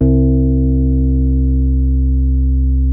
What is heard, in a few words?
SIDE MID C2, SYNTH PLUCK